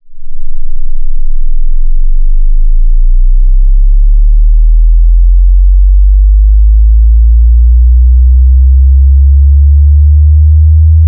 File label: 10Hz-200Hz; 30sec; SSI EuroMASS April 2022; active source